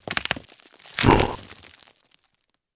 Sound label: golem attack; propack